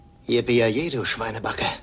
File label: yippieyayay